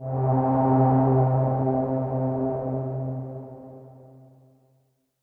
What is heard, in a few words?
Pad C, Synth Prophet One Shots; Electronisounds